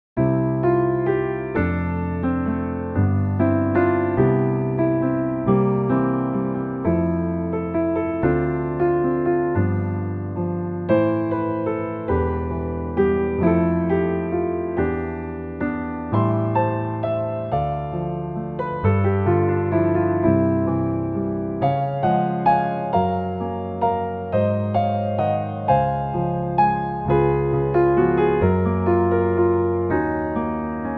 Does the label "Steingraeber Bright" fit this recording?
Yes